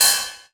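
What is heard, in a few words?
GOA ride, GOA KIT; Wizoo - Platinum 24 Electronic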